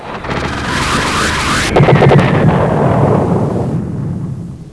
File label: DIVEBOMB; MAMMOTH